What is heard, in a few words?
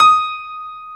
Stereo Grand, Keyboards; E-MU Producer Series Vol. 2 – More Studio Essentials